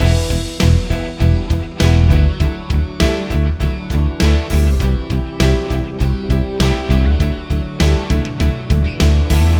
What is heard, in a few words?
Using Barre Chords Exercise Loop